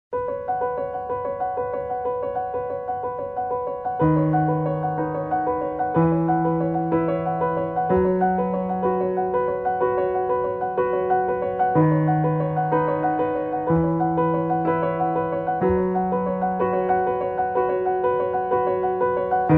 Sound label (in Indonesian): Nada Dering Anime; Your Name